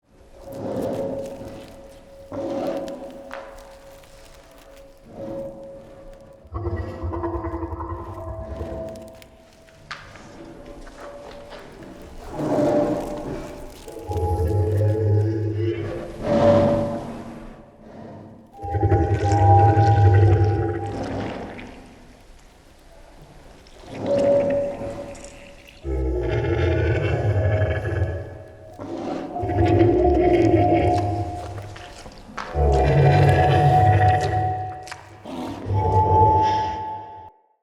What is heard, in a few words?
Corrupted Vision Breathing; Cinematics; Bromius